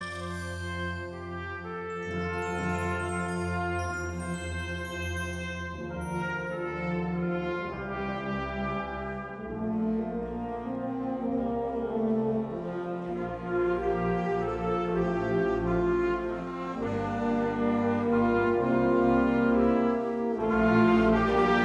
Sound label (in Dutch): Drum en show fanfare